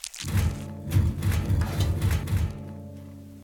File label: scrape scrape scrape; Chapter5End